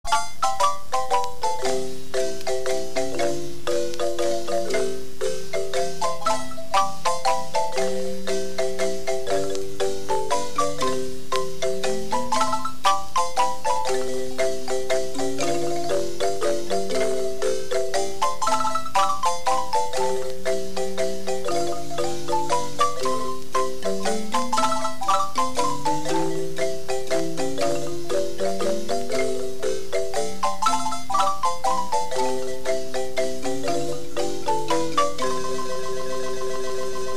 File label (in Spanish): Zapateado, marimba; musica guanacasteca; Marimba Diriá